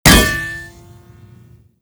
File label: bullethit steel